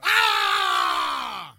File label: ah pain; ooh ahh